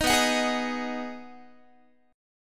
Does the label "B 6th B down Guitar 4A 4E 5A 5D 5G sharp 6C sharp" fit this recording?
Yes